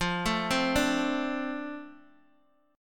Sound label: F 6th Guitar Open G, chord